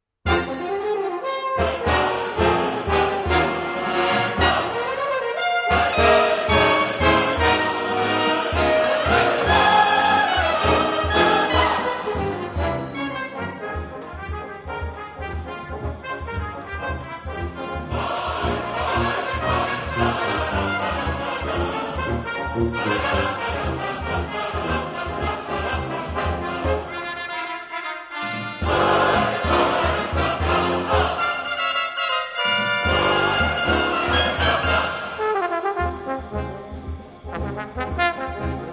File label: Finale, Allegro molto, Brass Ensembles; Metamorphose IV